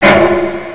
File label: metal, debris; svencoop